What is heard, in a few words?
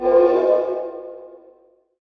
enemy specter interact cast